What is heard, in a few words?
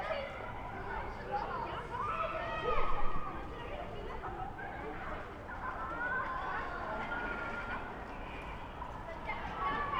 screams